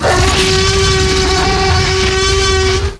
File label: scream